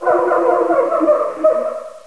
monkeys; svencoop

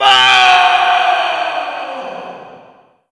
falling

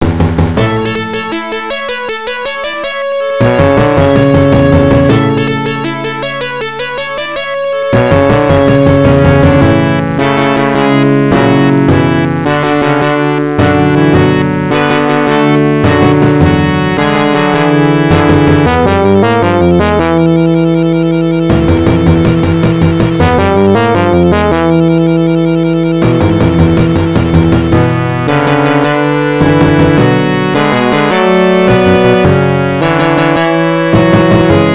music; breakfree3

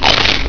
crunch

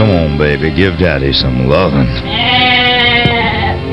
baaa; dod

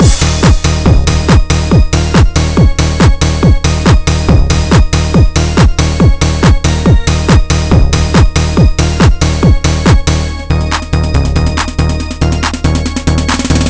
party; tfc

